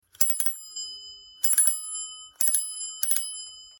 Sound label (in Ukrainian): zvonok velosipeda